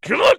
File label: pain sharp; Borderlands 2; Jack